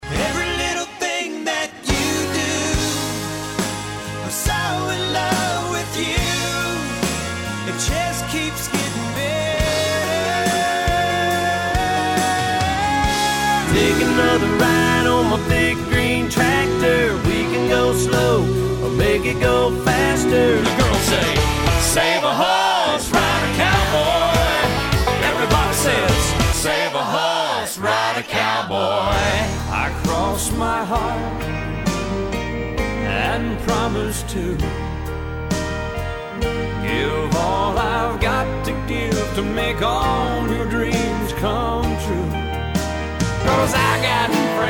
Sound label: Country